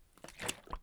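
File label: tetrapak with cap draw; Escape from Tarkov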